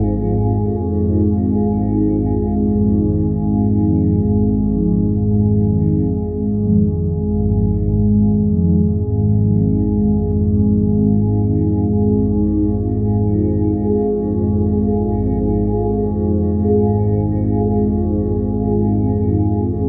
Pads

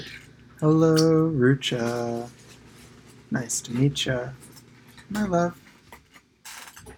Snare

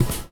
Noisey Kick

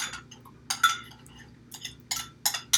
Snare

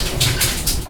Upticks

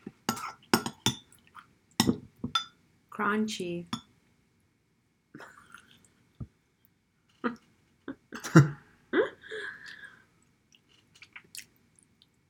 Snare

